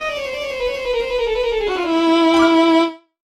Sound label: Game Over